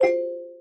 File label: plinko neut